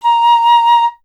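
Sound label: flute piccolo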